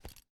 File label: jump